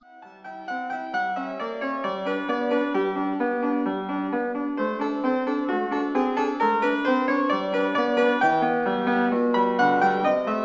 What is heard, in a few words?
Piano Sonata Andante; Mozart Piano Sonata No 16 K545 mvt 2